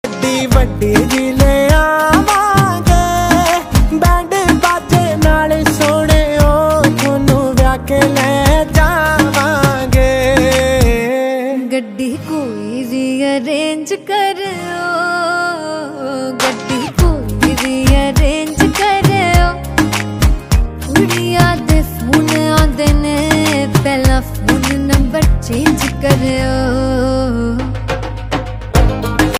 DJ Punjabi Ringtone

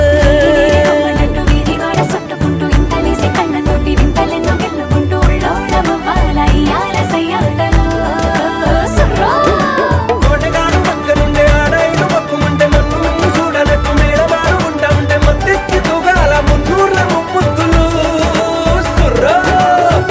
Telugu Ringtone

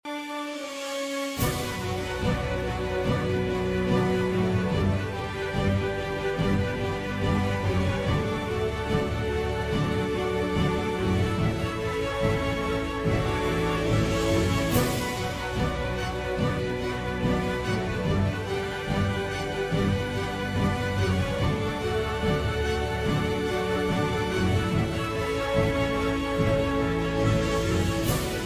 Dramatic Ringtone

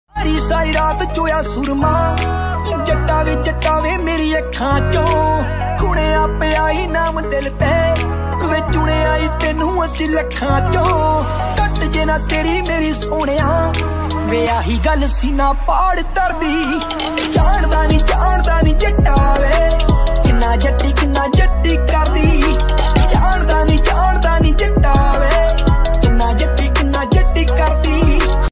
Punjabi Ringtone

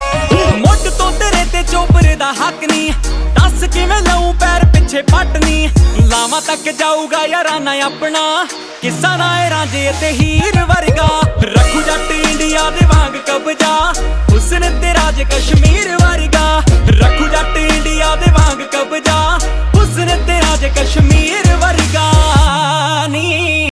Desi Punjabi Ringtone

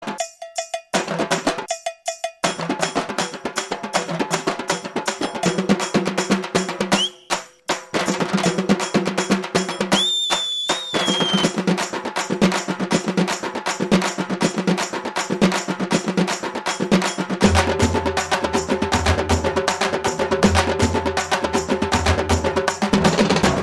Drum Beats BGM Telugu Ringtone

